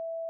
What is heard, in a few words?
sfx chime